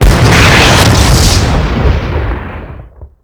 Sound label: vehicle death explosion combo; WAR